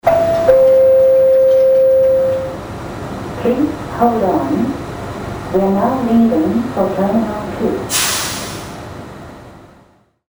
railway station english

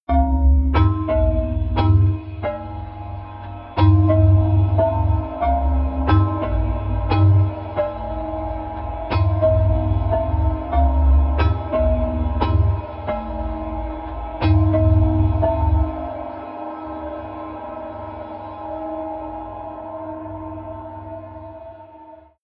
sequence dark vibraphone

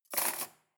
cutlery cake forks drawer place

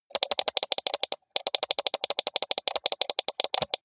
computer mouse click sequence steady long

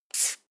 marker line short heavy

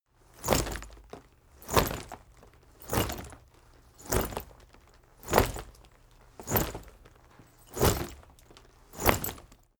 leather armor movement sequence

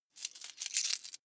coins clatter heavy

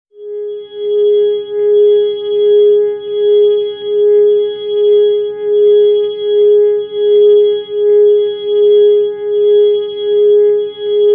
organ tone g sharp

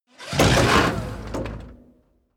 tank large damaged

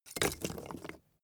wood logs small fall

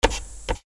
mechanical switch

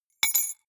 key ring small drop stone soft

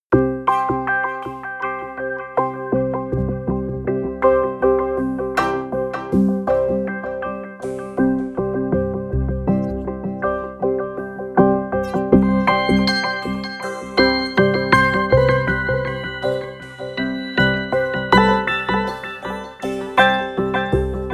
sequence eternal circus

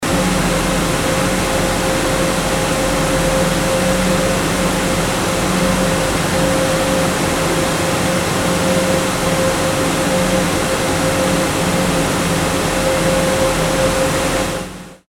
machine hall mid

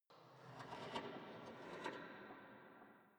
small impacts

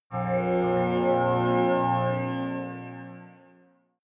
sci-fi saturn rising

